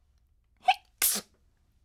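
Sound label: voice sneeze; kusyami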